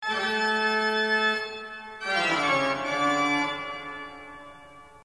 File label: organ